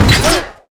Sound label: steam golem rocket grab; LoL; blitzcrank